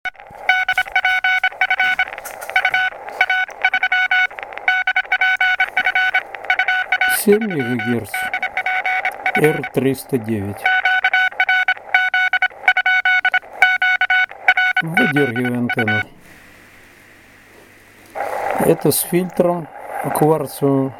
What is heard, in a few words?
Shum